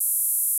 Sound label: Gauss Filt C4